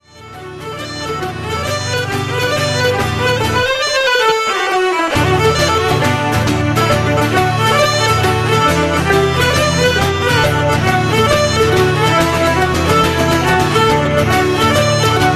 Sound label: banjo breakdown